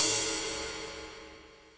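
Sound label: cymbal, left; Best Service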